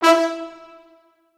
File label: Orchestral Brass, E3; Best Service ProSamples vol.20; AKAI